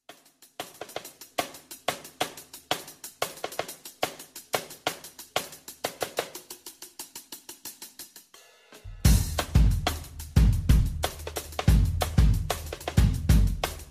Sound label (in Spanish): Bateria